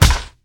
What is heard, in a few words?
baseball hit; lilmana; smite; vamana